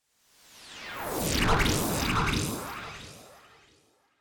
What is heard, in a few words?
Swoosh